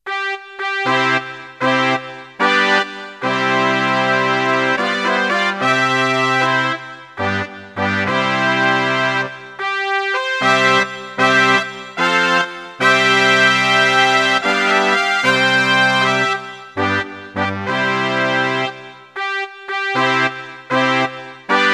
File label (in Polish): hejnal miasta; zdunska wola